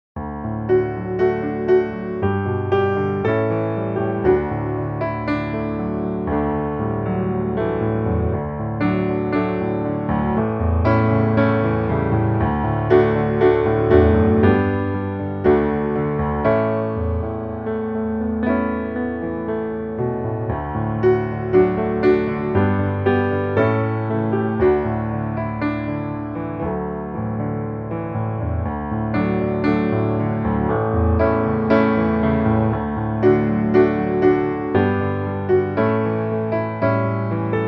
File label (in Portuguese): O que darei eu ao Senhor piano